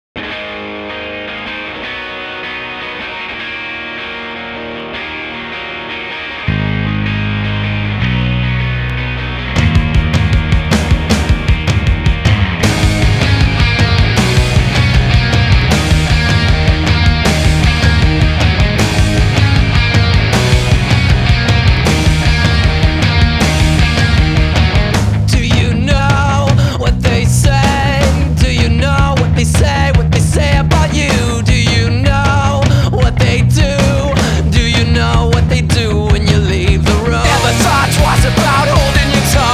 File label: music; ATV Offroad Fury 3; PS2